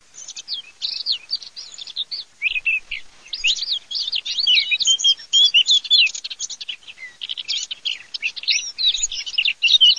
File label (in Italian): CAPINERA CAPINERA